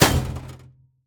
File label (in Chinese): 宝库破坏声